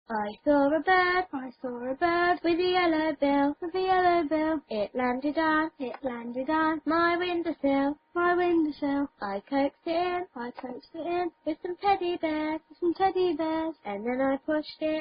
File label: Children's S; I Saw A Bird With A Yellow Bill (Children's Song); Pgl Song